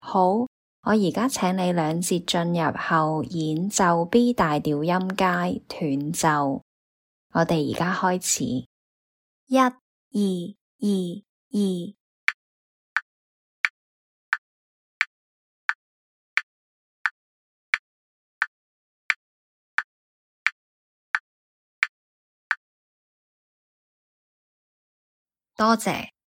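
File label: b major scale staccato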